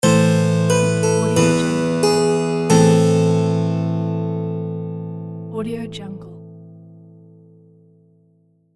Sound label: halloween harpsichord transition